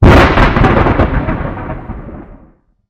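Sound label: lightning; DndGamePlayer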